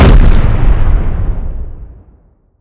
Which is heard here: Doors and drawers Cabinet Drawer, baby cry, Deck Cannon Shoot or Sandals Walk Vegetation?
Deck Cannon Shoot